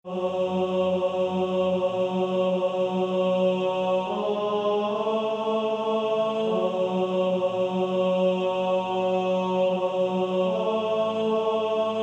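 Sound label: Male Choir, tenor 2, TTBB 4-part, Practice Music; Coventry Carol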